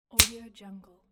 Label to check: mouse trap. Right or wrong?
Right